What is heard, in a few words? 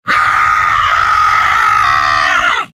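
soldier m pain critical death; tf2; garrysmod; mvm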